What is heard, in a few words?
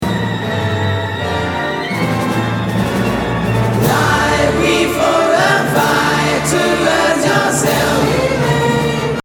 rock chorus